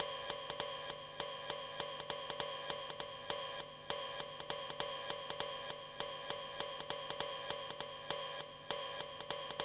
JAZZ; INIS; Funky Xtreams

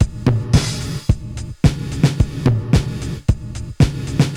80s Gated Verb Korg MR-16 (110BPM), cyborg beats

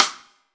stick; Greytsounds; Scott Peers Sound Engineering

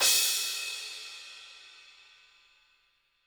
HiHat & Crash, Roland TD-20; HiHats

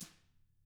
TAMA BR P, Drums; ILIO; Double Platinum Drums 1

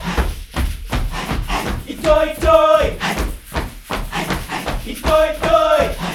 80, Group Chants, E Toi E Toi; Spectrasonics; Supreme Beats; African Contemporary

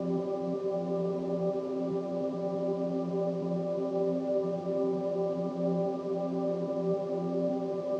atmo, pad; M8

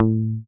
HOFNER MUTE, Bass

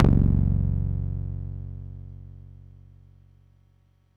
PAFMBass f#1, Yamaha YX81Z

